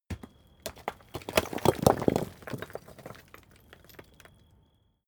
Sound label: stone medium fall; natur-tiere; steine